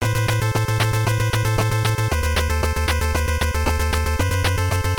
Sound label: boss battle; robocop